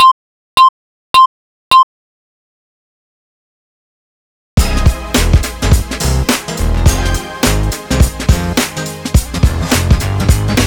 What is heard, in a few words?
Sweet Intro Example -10 Music; Radio Geretsried; Sendungen Kempfenhausen